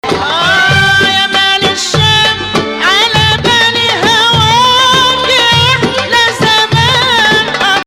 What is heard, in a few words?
Rast Ya Maal Rast Octave